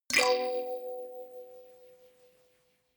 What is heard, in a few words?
notification kalimba rattle slide minor